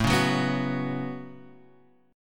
A 6th Add 9th, Guitar, Half Step Down

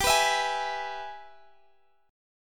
Ab Minor Major 7th Sharp 5th chord

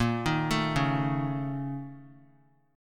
A sharp Minor Major 7th Double Flat 5th A sharp; A#mM7bb5 arpeggiated; JGuitar

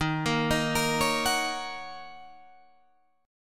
D sharp Minor 7th, Guitar, DADGAD

